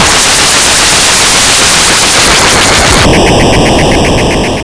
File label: Bomb; Rinno